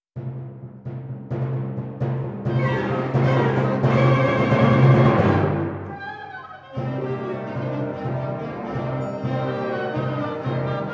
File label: Symphony in Minor Keys Vivace